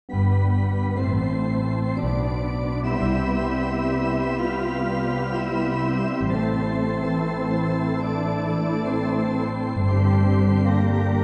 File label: Hammond like